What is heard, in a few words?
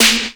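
SD, snare drum; X-Static Goldmine 1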